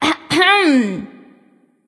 clear throat; smite; sol

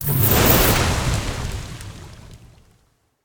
number4 emerge; sobek; smite

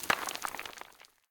debris; Smite; Cabrakan

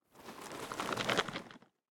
idle stretch; smite; god ganesha skin11

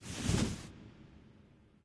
cloth; smite

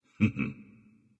heh heh; smite; anhur

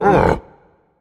surprised; Smite; cerberus

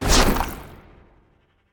spear throw; smite; Anhur

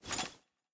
mvt armor; serqet; smite

